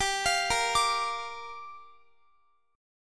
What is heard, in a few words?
G Minor 7th, Guitar; Gm7; arpeggiated; x,x,19,22,22,22